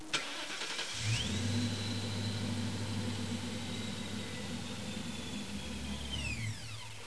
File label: startup; '98 Procharged GT; Start Up 1; The exhaust is stock with a set of 2-chambered delta-flow flowmasters.